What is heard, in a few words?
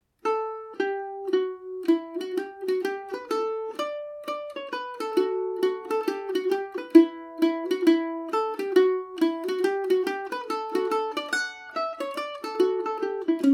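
barndance D major, Irish mandolin; sean hayes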